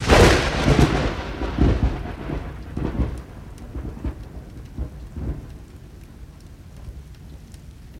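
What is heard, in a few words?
Thunder Crack Medium, thunder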